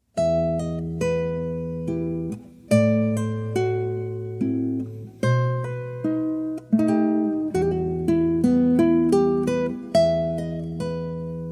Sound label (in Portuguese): violao; tarrega; adelita